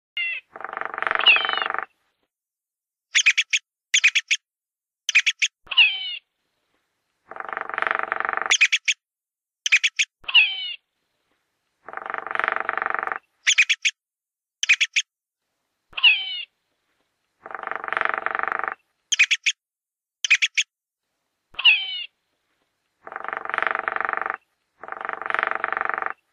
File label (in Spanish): Pajaro Carpintero sonido